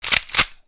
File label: gun reload